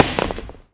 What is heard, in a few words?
grenade catwalk